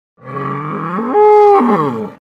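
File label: sounds of bulls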